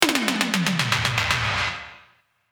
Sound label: toms